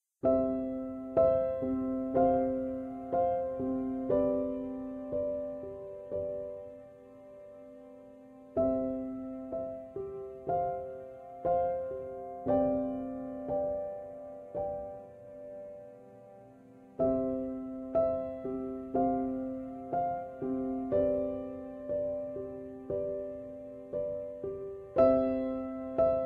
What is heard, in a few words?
loop